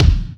rich high end bass drum single shot D# key, Royality free bass drum tuned to the; kick